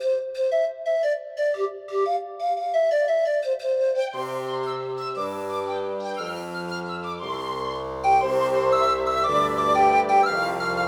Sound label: Music Heal Woodwind; 7 Mages